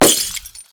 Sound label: Lamp Breaking